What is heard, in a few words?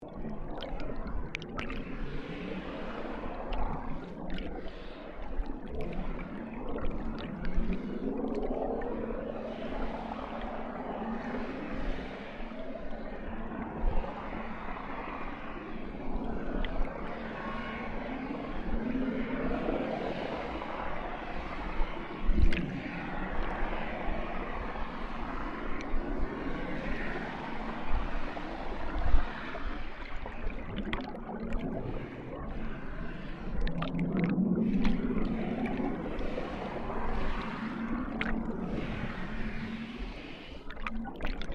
BOAT CUSTOM ENGINES OPERATING SUBMERGED UNUSUAL LOOP; small resteraunt boat; Vehicles